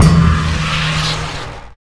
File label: Stab Show; FREE SFX PACK VOL 2